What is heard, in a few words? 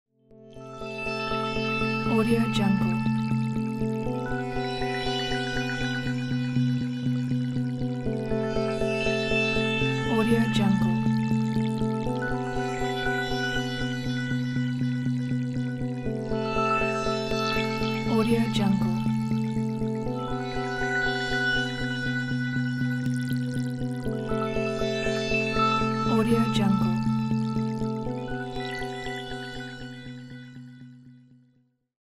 water ambient sound